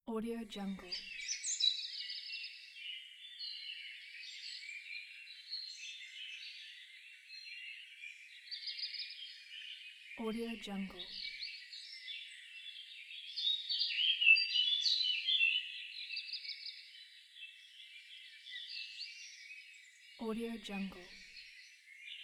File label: the birds forest ambience